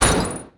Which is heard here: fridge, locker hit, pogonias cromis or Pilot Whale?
locker hit